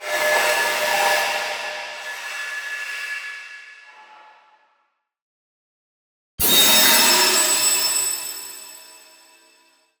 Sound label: Ice Spell Healing Divine Blessing; Healings; Arctic Aura